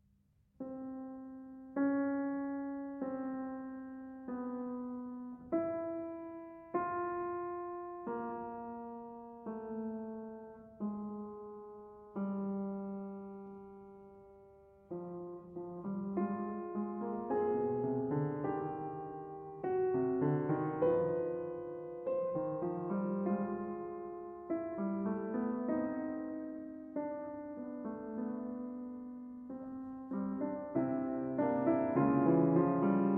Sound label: Prelude & Fugue in F Minor, BWV 857 II. Fugue